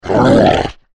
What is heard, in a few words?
Monster Troll Growl Snarl Creature; Horror; Animal; Monsters; Blastwave